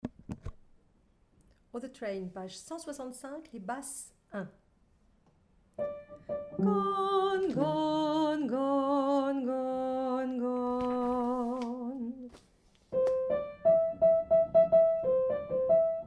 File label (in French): Basse